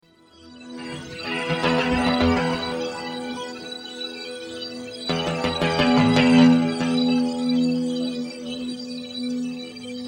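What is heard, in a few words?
solo extraction dry, guitar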